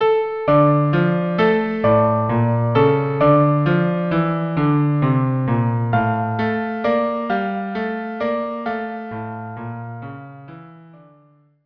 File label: violin/cello duo, traditional Jewish song; Yedid Nefesh; Composer: traditional Jewish song, arr. David Gable; Instrumentation: Violin and Cello